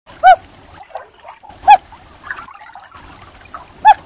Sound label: hoot